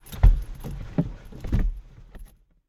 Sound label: foley hit; driver; Blind Drive